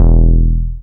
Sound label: BAS MKS-50, Roland